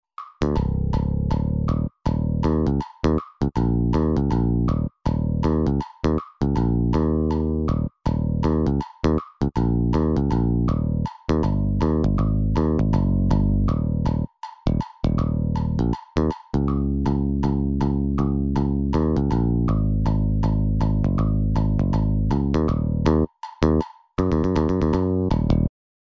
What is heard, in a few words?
Bass Guitar Etude